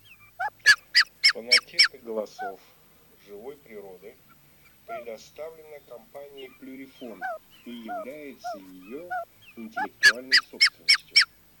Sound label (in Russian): Фазан курица Fagiano